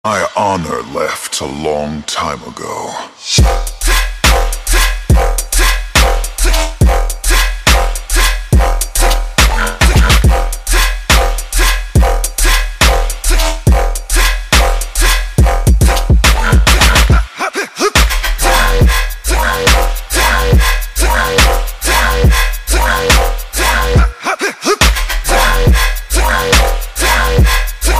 Dub

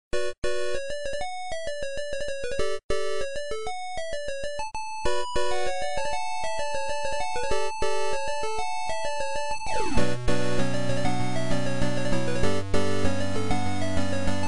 8-bit

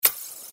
Message Tone

